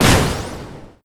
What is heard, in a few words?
Enemy Delete